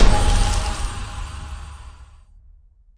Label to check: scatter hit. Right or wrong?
Right